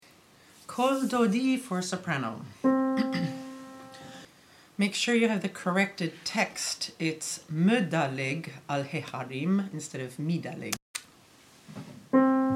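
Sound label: Kol Dodi soprano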